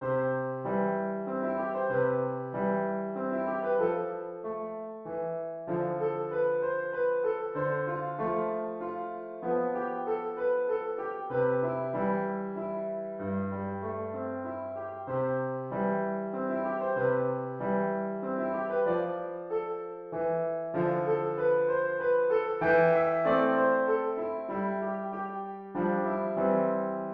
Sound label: musettes waltz piano